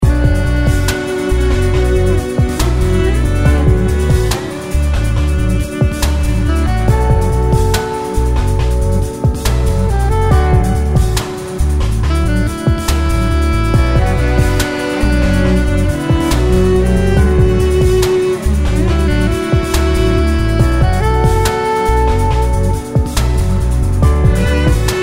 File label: Melodic Strings and Wind